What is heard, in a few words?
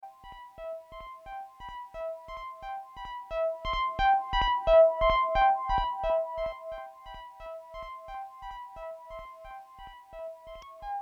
Quasar Distance Pitch; Neuzeit Instruments